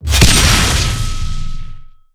Add Explosion; netent; narcos

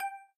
count Up Loop End; netent; flowers

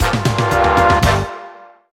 small Win; netent; reelsteal

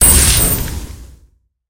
lock It Award; narcos

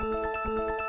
count Up Loop; netent; wildwater

